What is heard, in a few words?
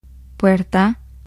door; gcompris-data